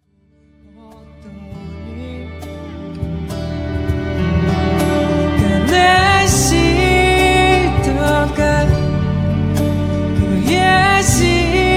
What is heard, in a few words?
melody